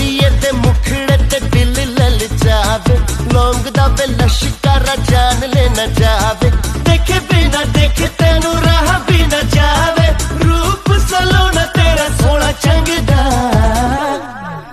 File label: romantic ringtone; bollywood ringtones